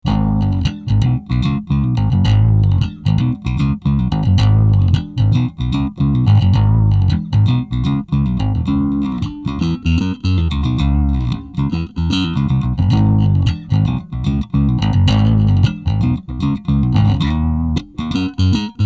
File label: riff